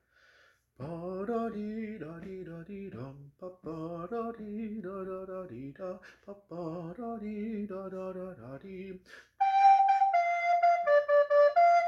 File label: Alto Parts I J, recorder